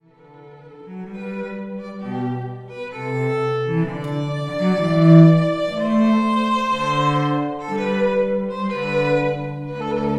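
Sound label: Air Duo, String Duet Wedding Music; Handel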